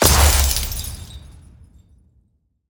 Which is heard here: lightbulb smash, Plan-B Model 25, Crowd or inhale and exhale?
lightbulb smash